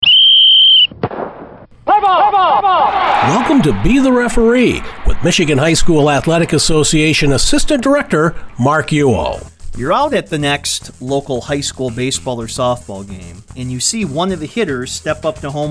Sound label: Wooden Bats